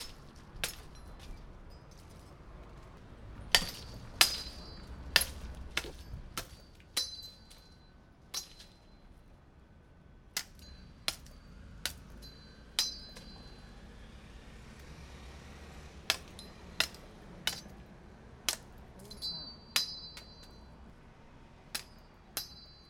Machete

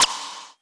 Zap Clap

